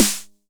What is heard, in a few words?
909 SD; Goldbaby Tape808 & Tape909; The Tape 909